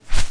WING; Messiah; The Games Machine 76

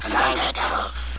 ERROR

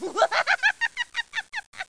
He Laughs; Bible Fun Pack; PARSONS

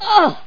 OOah; Total Distortion

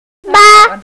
baa

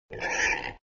Boar Sound; moonstone madness

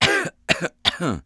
cough, English Voice; The Sum of All Fears

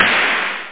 noise snare

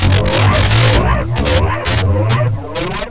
prox; X-men; weapons

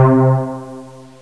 pluck; Descent II

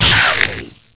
bloody

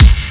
bass drum good short

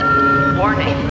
Failure Warning; Coffee Break

